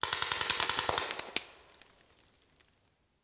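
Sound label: Paintball Gun Impact; Sound Ideas; Guns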